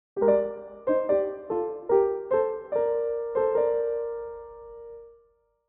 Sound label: Video game success victory win minimal piano